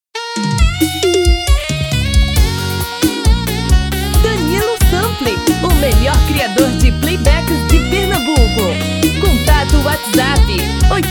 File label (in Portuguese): Versao Brega